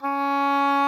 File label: OBOE G4, REEDS; Greytsounds VOL-1